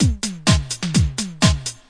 127 bpm, breakbeat; drum loops and breaks; call me tom

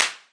CP, Claps

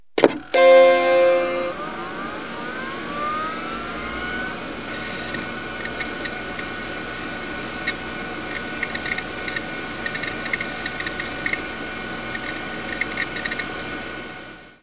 mac startup fan noise; Sound FX Real Life

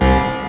verby bass; Basses

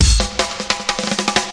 long intro

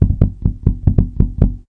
Bass FX, bass guitar licks FX

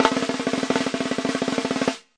snare roll

